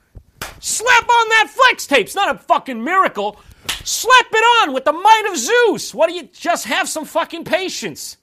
slap

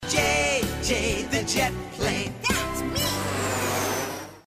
jet plane

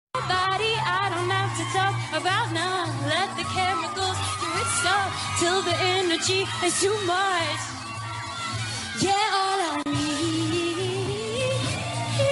live mic feed; selena gomez

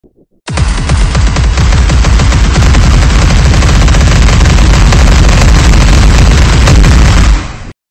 barrage asmr; planet shaper

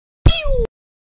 pew pew sound effect

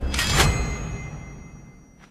armor selection

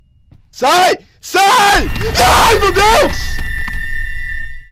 aaaah

